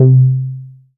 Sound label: Rubber Bass; Best Service Gigapack 2